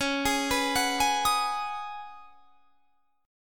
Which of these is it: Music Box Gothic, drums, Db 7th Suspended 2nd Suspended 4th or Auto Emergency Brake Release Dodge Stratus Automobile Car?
Db 7th Suspended 2nd Suspended 4th